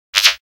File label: computer bleep; NCH Library